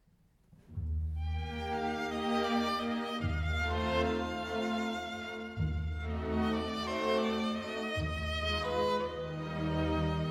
souvenir d'un lieu cher op 42 melodie moderato con moto